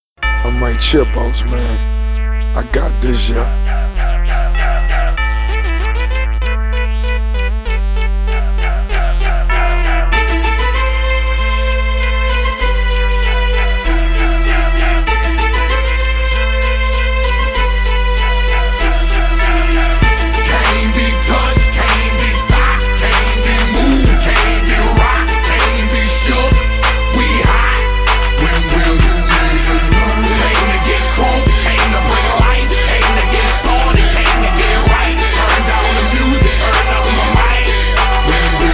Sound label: muzic; ze swamp escape